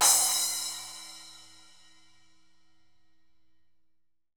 crash 14; E-MU Producer Series Vol. 7 – Old World Instruments

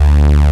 LO SYNTH BASS; E-MU Formula 4000 Series Vol. 2

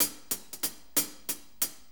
6-8 HiHats 60, HiHat & Ride Loops; Sonic Foundry (Sony Creative Software) - Loops & Samples Drum Tools

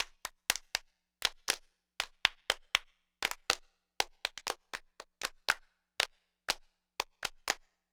Handclap Rhythms, Ukraine Gypsy; Sonic Foundry (Sony Creative Software); Continental Drift Aftershocks

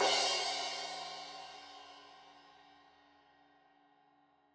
Crash 15in L edge, Cymbals Stereo; Deeply Sampled Drums

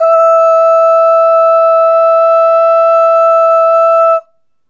Ooh Long E4 Dry; Harmonic Building Blocks

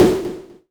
tom, Korg ER-1; Kit 10

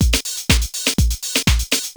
SIMPLY LOOP, breakbeats; Crypton - DrumBase5000; BREAKBEATS#2

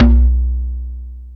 Internal Drums, Roland JD800

